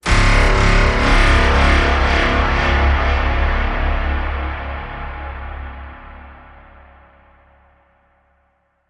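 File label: Blast Robotic Wobble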